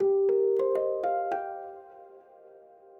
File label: ethno, 160, C